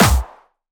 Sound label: Attack Noize, Jumpstyle Kick; Bassdrums; Jumpstyle Multis; vengeance essential club sounds-3; VEC3 Bassdrums